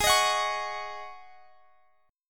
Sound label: G sharp 7th Suspended 4th G sharp, Guitar Half Step Down